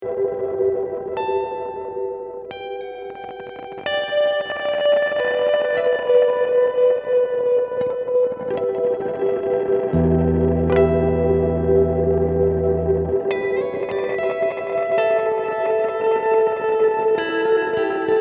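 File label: hologram electronics microcosm guitar haze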